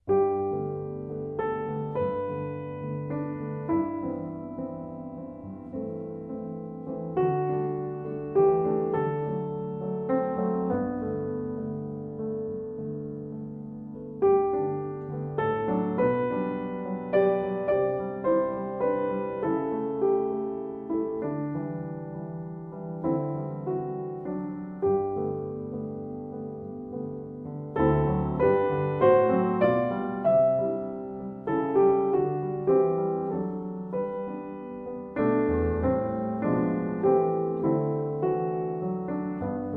Solo Piano

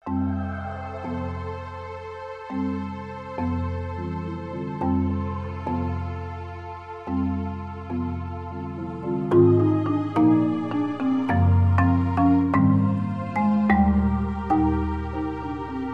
Marimba Flowers

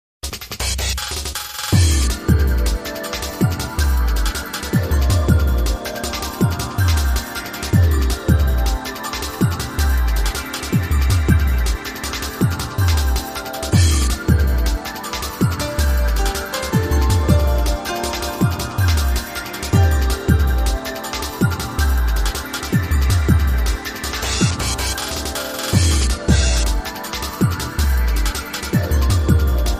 Underscore

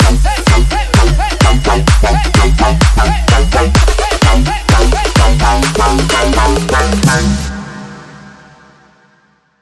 Link Sting

